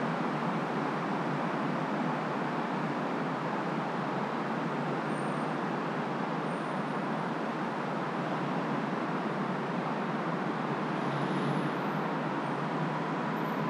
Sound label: interior tunnel; ambient; dust2